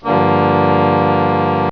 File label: chord